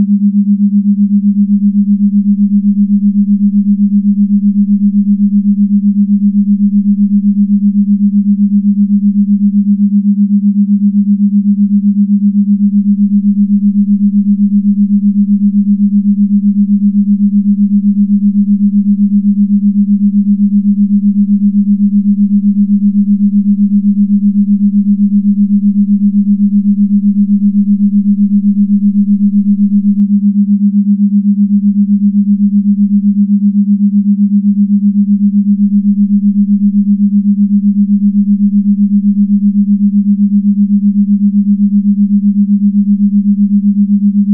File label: schumann 7.83hz